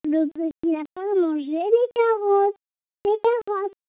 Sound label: synth